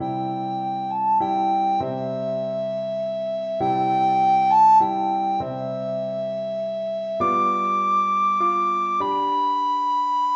recorder

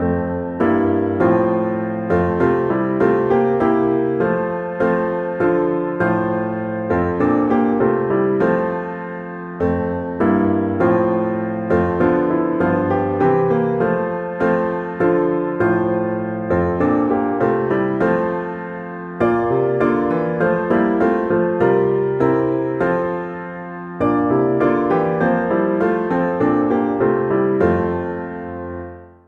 once in royal piano

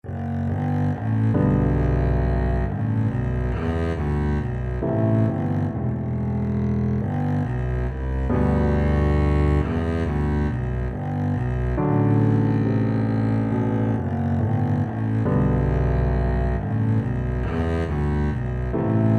danny boy, Double Bass

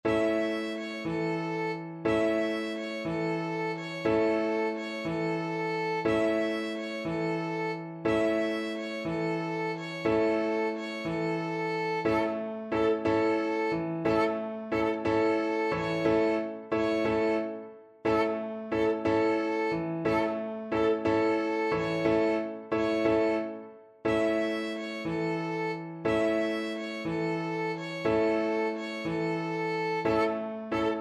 sorida violin

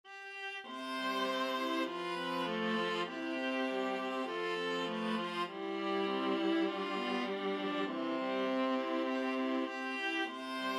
blue bells scotland viola trio

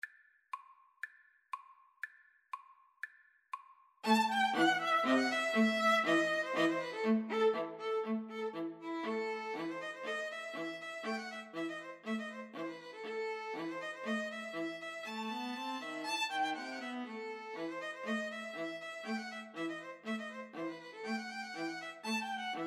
freilechs von der chuppe, 2 violins viola

